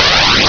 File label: Zoom open; MiniWinDemo 4.0